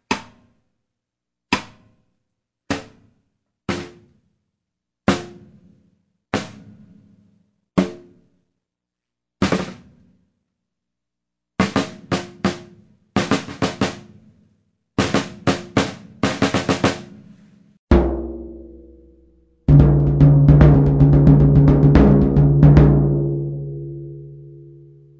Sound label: tko custom floor tom 16